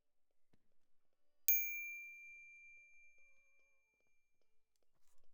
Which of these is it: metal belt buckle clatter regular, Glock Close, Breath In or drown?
Glock Close